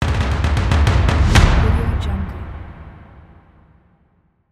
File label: drum transition